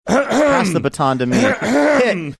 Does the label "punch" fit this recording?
No